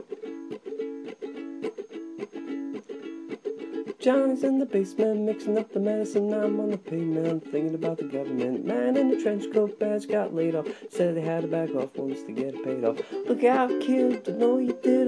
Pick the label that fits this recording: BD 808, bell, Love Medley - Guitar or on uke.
on uke